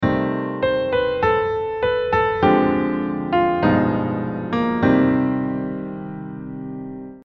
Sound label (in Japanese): スケールで考えるメロディー